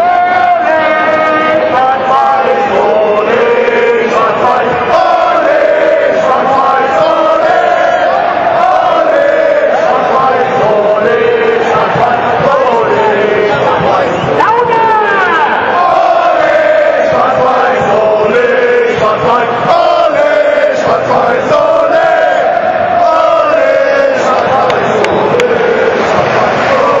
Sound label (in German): Ole schwarz weiss, gesang